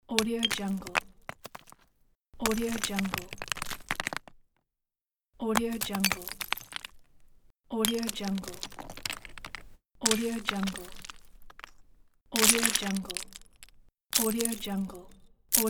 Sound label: stones; audioheart